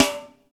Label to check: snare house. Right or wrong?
Right